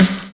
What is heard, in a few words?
SLOWPITCH